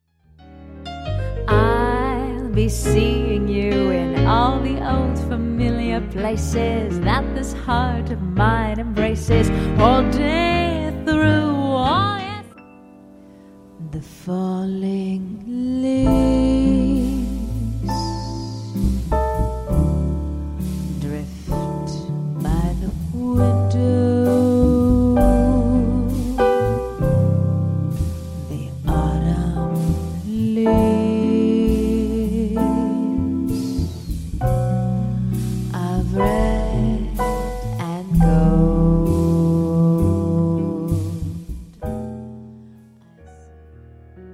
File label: Vocal Music; Voice-Over Girl